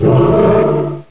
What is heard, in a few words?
growl; Hexen II; mezzo